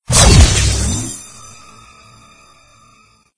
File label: Teleporter In